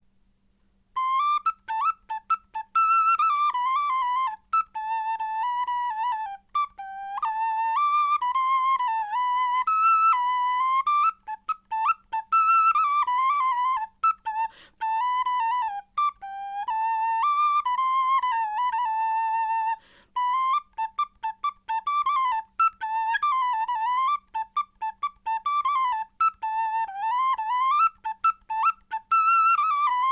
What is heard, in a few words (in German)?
melodie